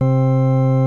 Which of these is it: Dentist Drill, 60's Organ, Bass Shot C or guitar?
60's Organ